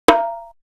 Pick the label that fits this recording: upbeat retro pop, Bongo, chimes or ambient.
Bongo